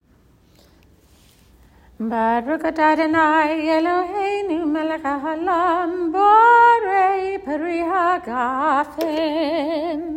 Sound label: Kiddush, prayers